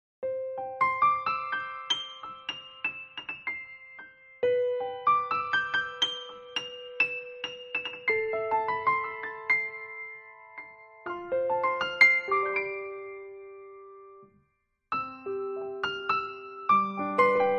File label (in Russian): kolybelnaya fortepiano